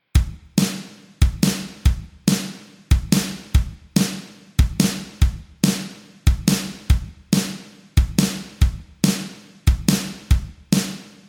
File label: (G) No Backing Vocals